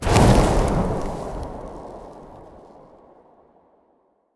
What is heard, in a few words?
shout frostbreath